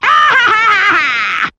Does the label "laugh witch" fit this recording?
Yes